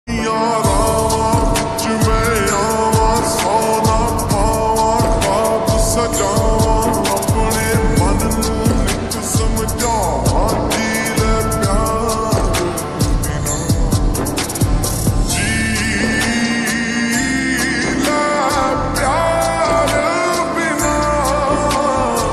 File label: slowed reverb punjabi ringtone